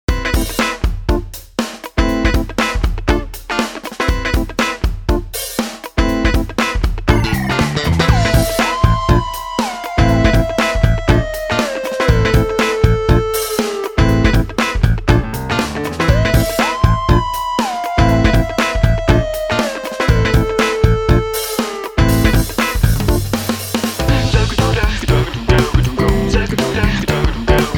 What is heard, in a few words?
spooky